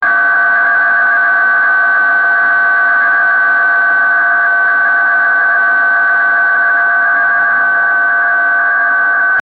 collision alert on the sp; space station

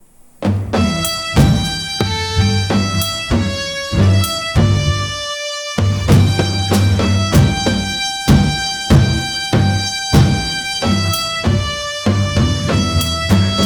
produce vibrant shrine in, instrumental

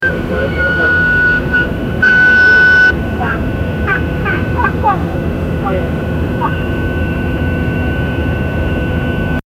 passengers screaming, airplane

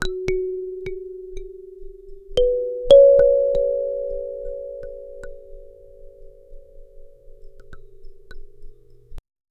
f minor scale, arpeggio

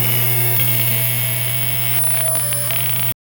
retro computer startup, mechanical clicks

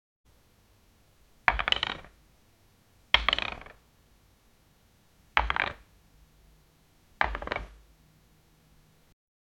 a chess piece move

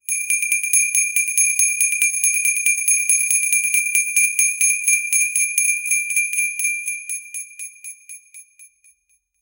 shiny jingle christmas be

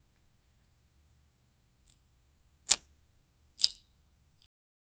light effort sound

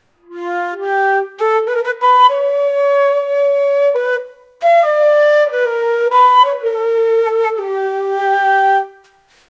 flute sounds as; instrument

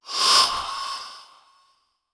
shadow blink teleportatio

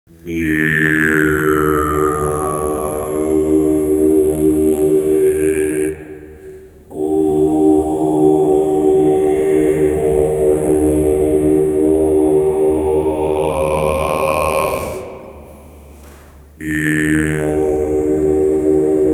low dim voice of mytholog, chanting

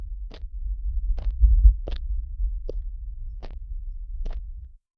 sounds of footsteps walki; low

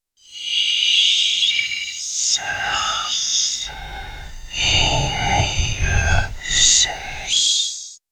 ghostly whisper indeciphe

